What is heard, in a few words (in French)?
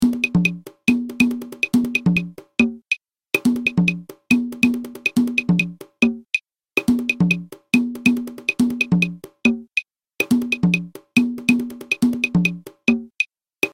guarapachangueo cajon clave; Naissance du Guarapachangueo